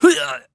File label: VO Death; VIP; Aragami